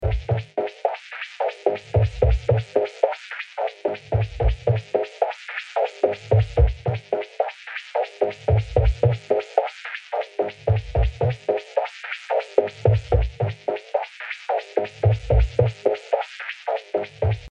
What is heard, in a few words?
loop 110